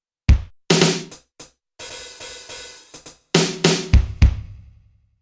KOPRO+ Rock Kit

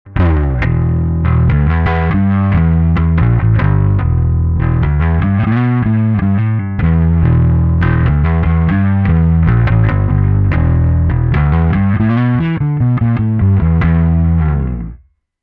Alpha neutral

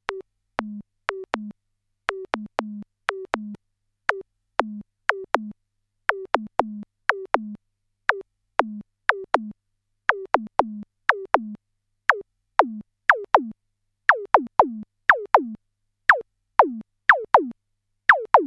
zapp

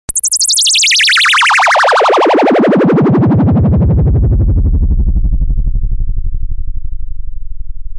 PPG Filter Drive Medium; PPGred

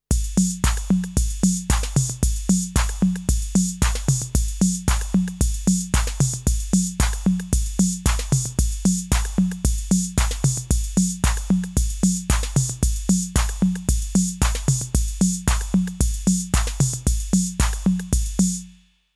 Loop

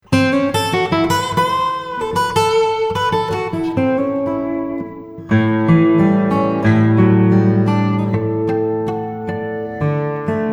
Git EMT140; Adax

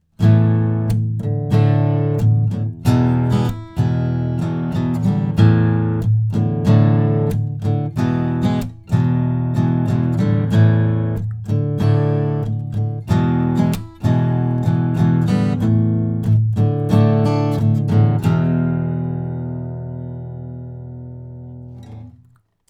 Hornet Git Color

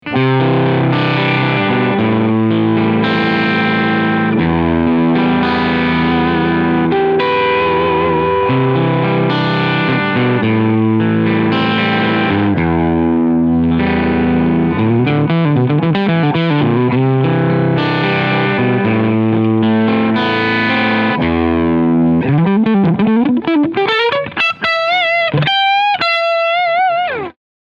Overdrive EQ Full

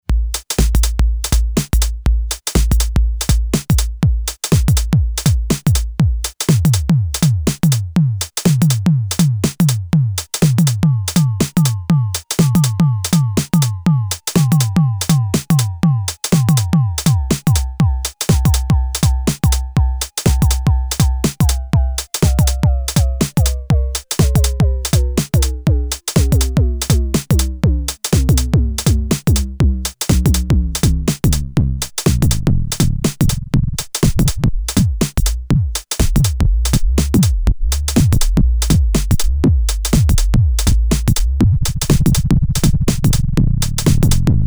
Drum Kick; IKM Uno

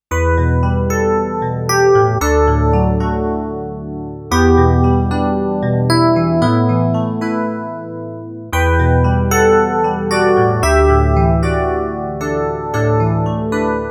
Grand Piano XXL E Piano

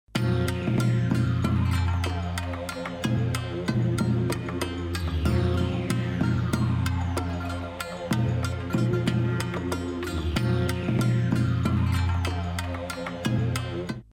Mooer Synth Drum Melody Loop; 79 New Japan

